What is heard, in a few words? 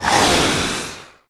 Phantom Spirit